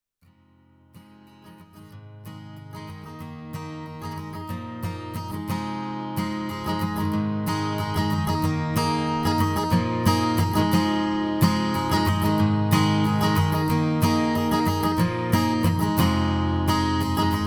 Solo Voice And Acoustic Guitar; pop-singer

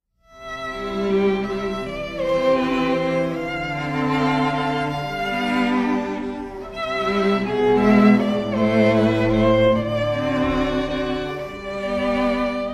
Classical, String Quartet

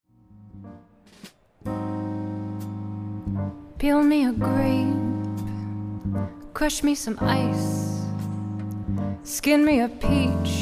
jazz band

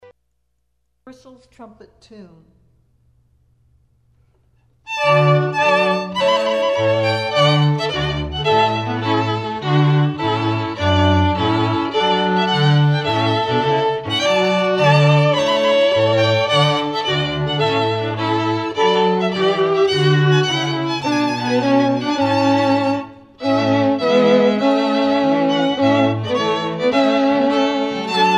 quartet, classical string quartet

